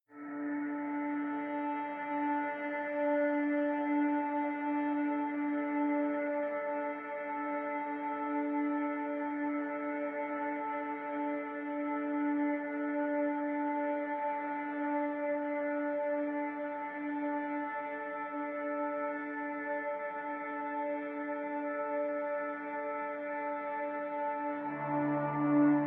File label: no solo vocal